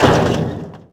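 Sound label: metal pole hollow, Impact; Cars; XBOX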